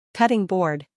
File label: cutting board